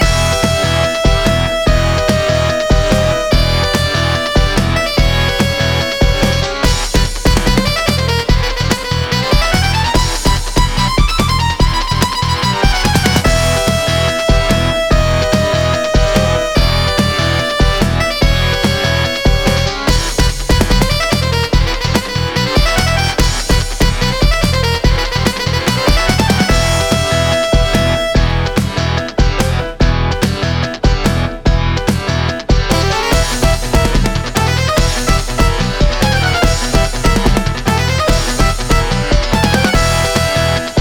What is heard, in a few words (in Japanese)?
喧奏 kenso Loop